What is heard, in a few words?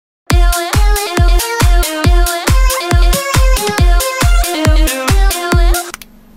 UwU but Meme Phonk; Dr. Livesey